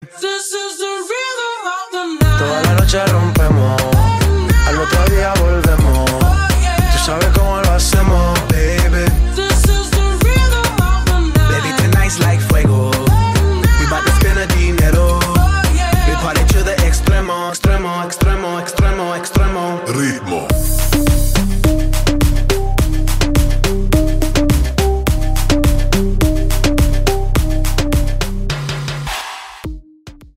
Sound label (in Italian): Ritmo